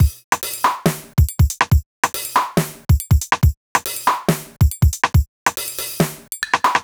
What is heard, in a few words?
140 Drums; Touch Loops; DigitalSpectrumsMini